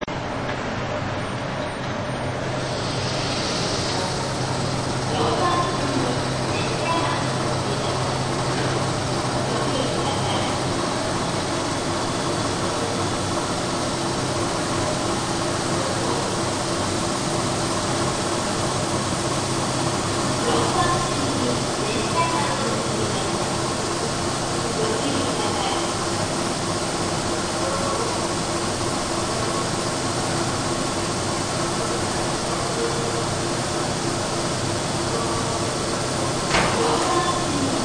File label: compressor, Railway Sound